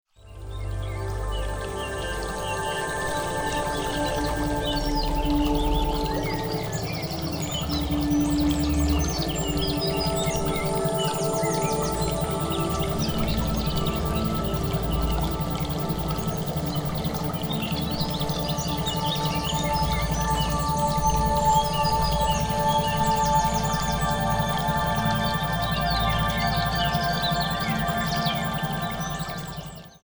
Isochronic Tones Brainwave Entrainment; Mood Support for Persistent Depression

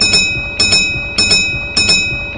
bus bell

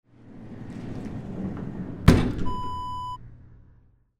elevator door close

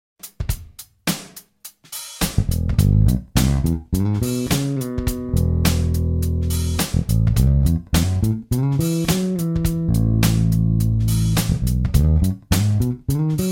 Major pentatonic lick

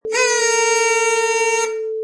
Party Horn

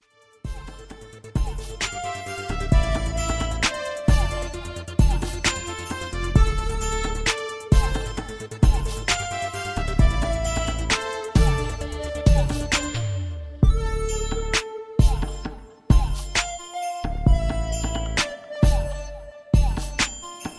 66BPM

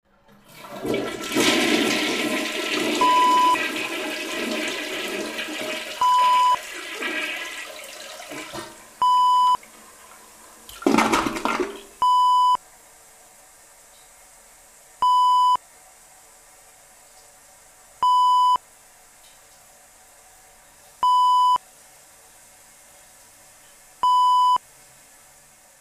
toilet flush

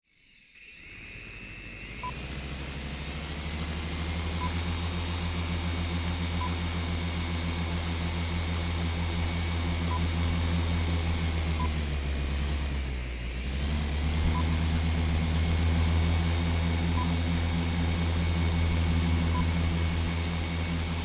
Remote Control Helicopter

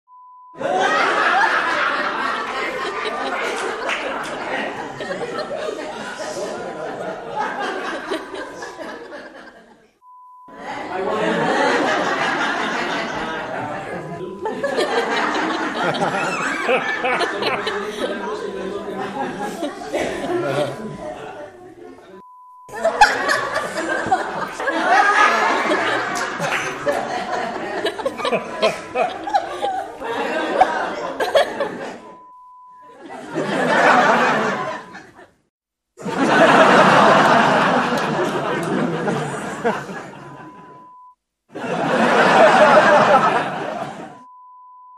Laughter Collection

